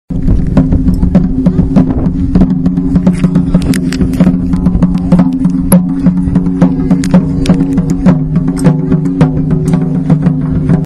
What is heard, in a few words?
taiko